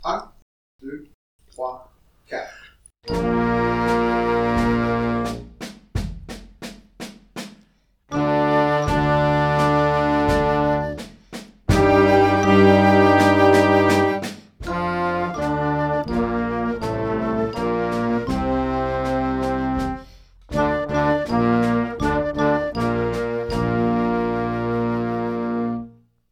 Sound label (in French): bon point noire a 80